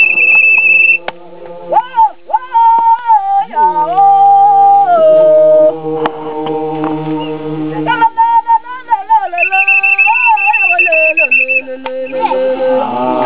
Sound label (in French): chant, ethiopie